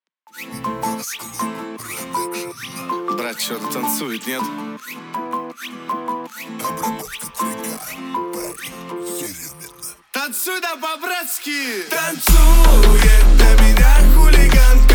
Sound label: 33-37Hz Low Bass